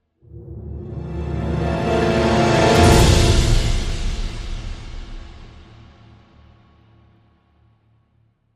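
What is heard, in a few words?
Crescendo; Payoff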